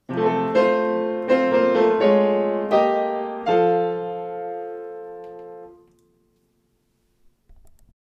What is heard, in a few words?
Fanfare